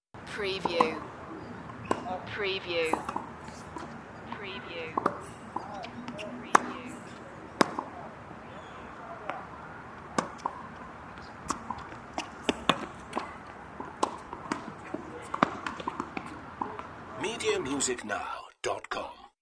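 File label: Tennis Volley; Sports & Games / Tennis & Table Tennis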